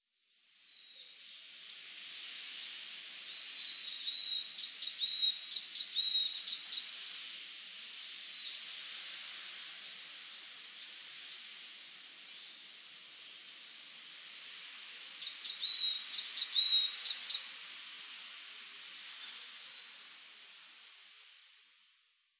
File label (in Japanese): yamagara, kodaira